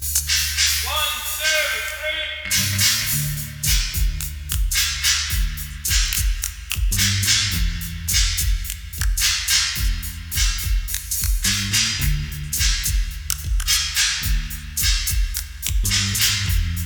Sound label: clapping drums clapping noise